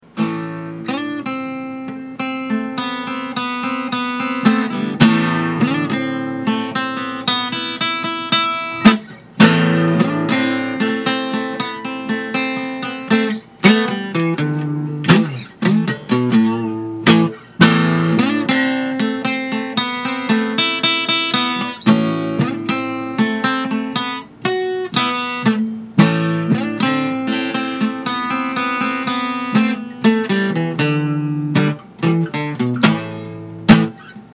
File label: guitar riff - A 3rd fret slide then 4th 7 and 3rd 5